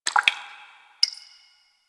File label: Ocean Interior Drips; Ambience; Warframe; Grineer Ocean